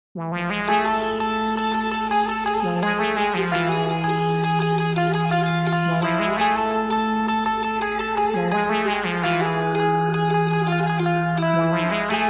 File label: cosmic oud